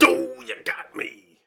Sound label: punkcroc pain; Fight Knight